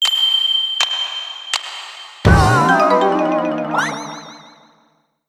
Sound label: puzzle lose